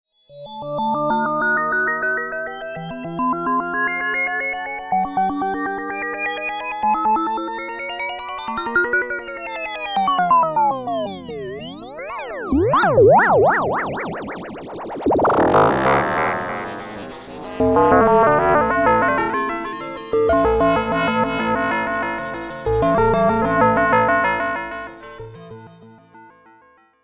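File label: Tap Delay Vibrato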